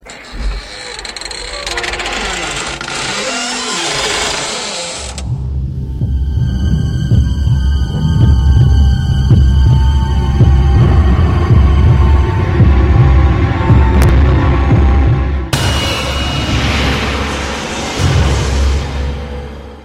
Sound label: eerie halloween